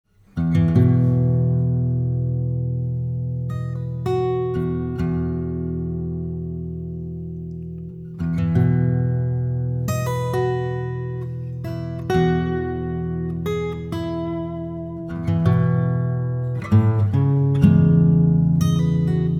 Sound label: Ampex ac guit